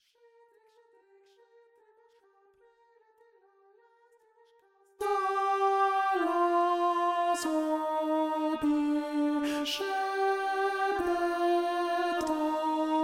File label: shchedryk alto